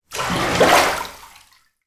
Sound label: household bath water movement deep; sound effects one